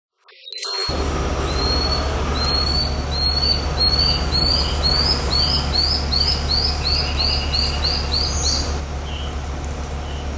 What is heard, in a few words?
Lanceolated monklet